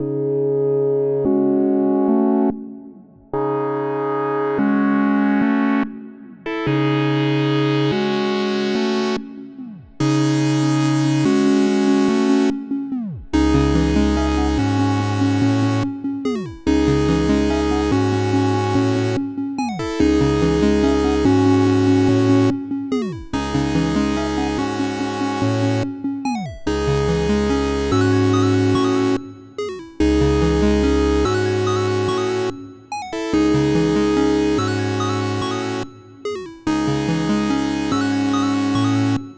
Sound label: 144 BPM C# Min, Melody; Cymatics; 2022 Melody Collection; Wavy